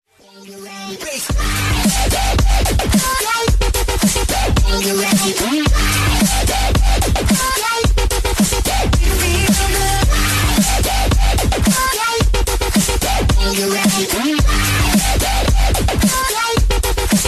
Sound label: hard electro ringtone